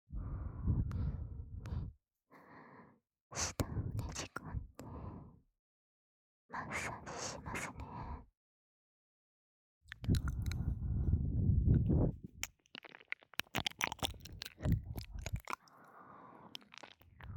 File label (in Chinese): 右 黒耳 伸入舌头高耳压舔耳, 舔耳按摩, ASMR